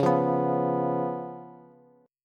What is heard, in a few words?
Db 7th Db down Bass; Db7 strummed; JGuitar